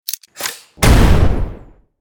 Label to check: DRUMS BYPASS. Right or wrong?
Wrong